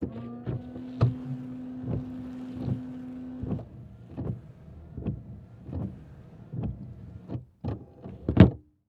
Transportation Car Monte Carlo Interior Windshield Wipers With Liquid